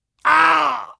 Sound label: die; metropolice; hl2